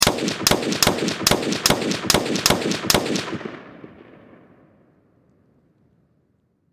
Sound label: Warfare Machine Gun M16 Single Shots Rapid Fire Distant Perspective; SFX Bible